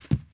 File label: carpet, Footsteps